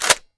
m4a1s bolt back; weapons; cstrike; csgo

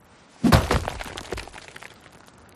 miner hit finish

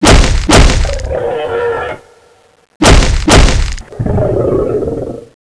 attack tentacle; cstrike; Boss Angra Reks; angra zbs

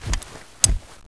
ursus flip, knife; weapons; cstrike; csgo

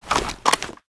galil draw; weapons; cstrike; csgo

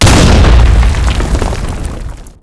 hegrenade; weapons; cstrike; csgo

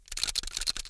deagle special look at; weapons; cstrike; csgo

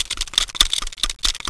widow lookat loop, knife; weapons; cstrike; csgo

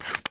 m4a1s silencer screw; weapons; cstrike; csgo

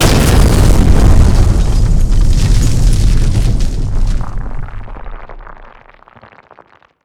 c4 explode; weapons; cstrike; csgo

